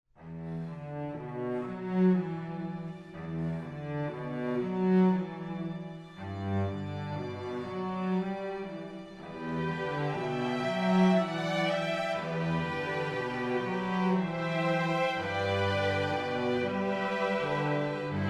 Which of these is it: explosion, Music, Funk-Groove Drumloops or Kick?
Music